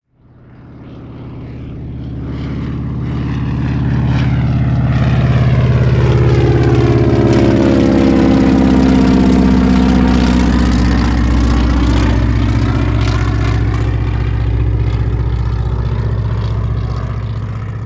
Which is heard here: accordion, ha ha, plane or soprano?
plane